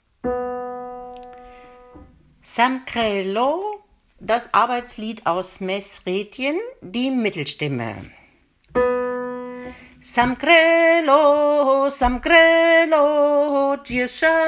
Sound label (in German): Mittelstimme; Samq relo